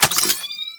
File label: Reload, Pistol; Amphis